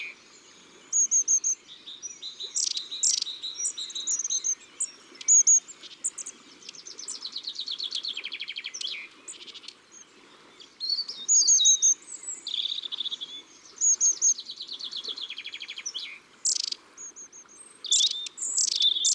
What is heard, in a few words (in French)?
Mesange A Longue Queue; Ardeche; Concerts Naturels De l'Ardeche